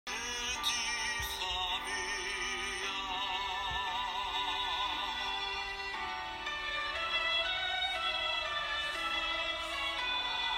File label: Nessun Dorma Alto